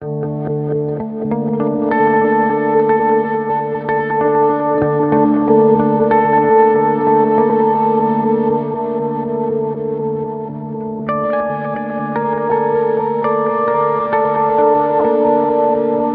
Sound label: G steel